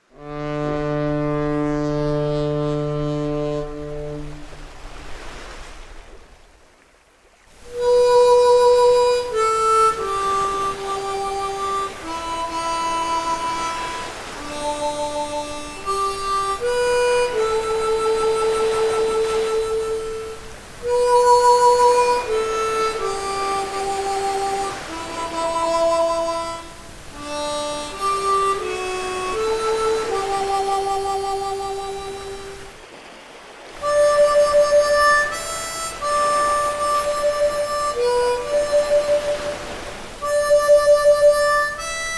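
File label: solo G, Mundharmonikas; Bestattungen Trauerfeiern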